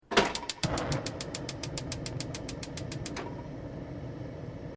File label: HOTPLATE GAS PALOMA IGNITE OPERATE, Portable gas cooker; Kitchen; Household